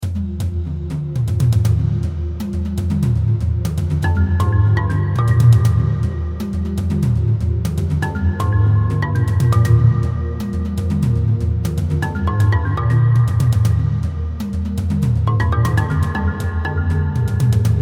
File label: tv music